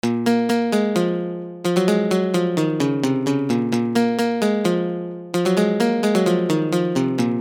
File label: guitar 130; Audialab EDM Elements